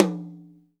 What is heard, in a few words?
TOM; Best Service Gigapack 2